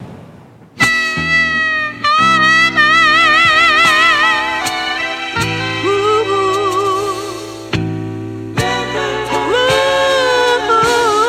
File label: Beat, Em, 106; Beat Block purge vol 02